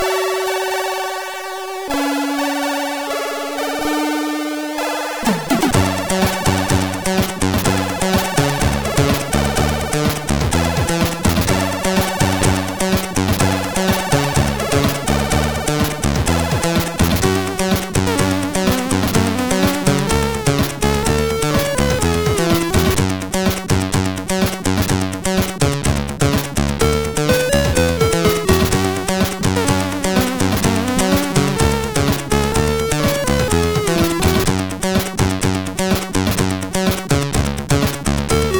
Asian Way, CSG8580R5; hvsc; Moon

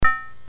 BASIC sid MOS6581R4, GAMES; Sword of Fargoal

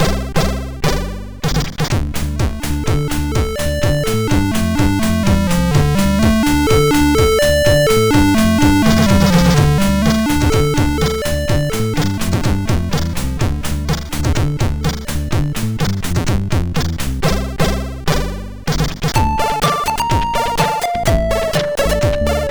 Russian Gun, MOS6581R4

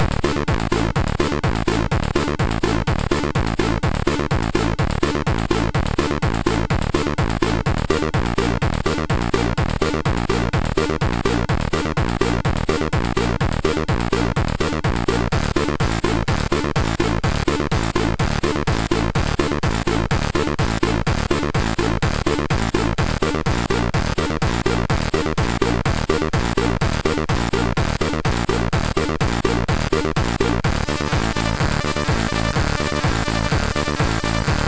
Race, sid, MOS6581R3

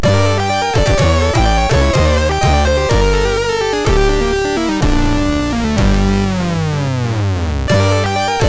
Fade In Intro, MOS6581R2

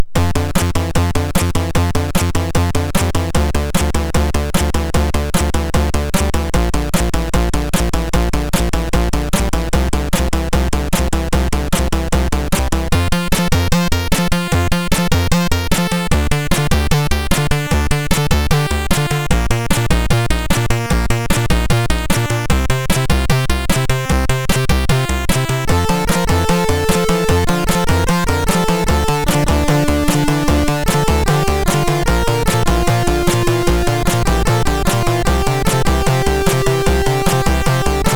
Remix, sid MOS6581R3; SigmaZeven

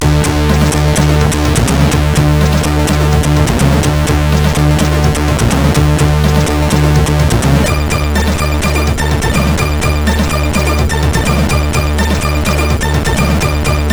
sid, CSG8580R5

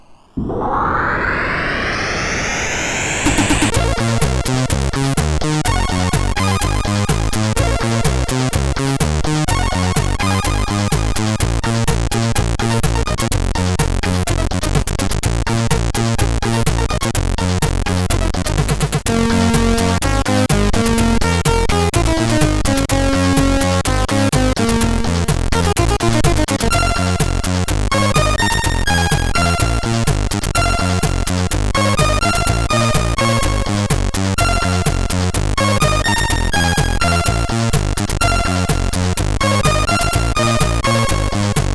Disco Night sid CSG8580R5

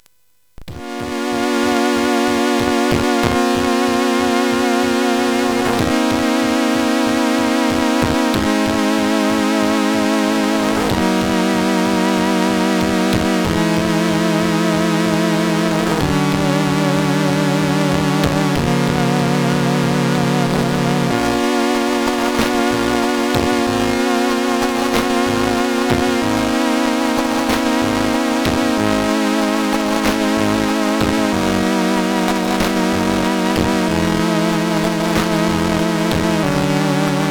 Digi Music sid CSG8580R5; hvsc

